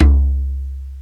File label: ETHNO DR, PERCUSSION; Crypton - DrumBase5000